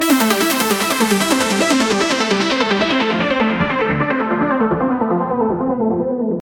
Synth, saw, fast speed, falling arp, medium reverb, falling high-cut, C major; Audialab EDM Elements